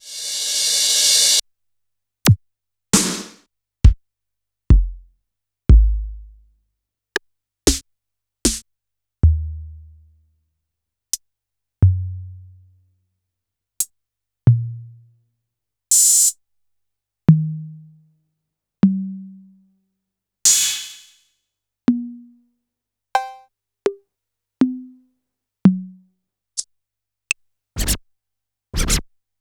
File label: Yamaha PSR-E323 Analog Kit